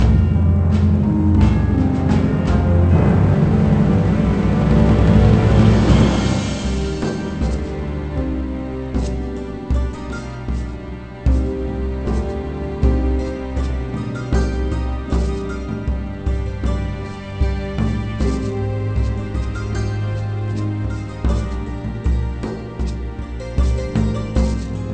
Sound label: Death Music; How to Train Your Dragon; nds